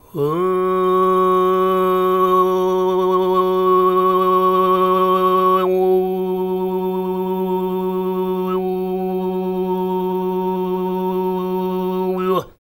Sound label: FREE, TUVAN MID; Spectrasonics Vocal Planet CD5; World Voices